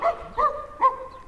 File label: call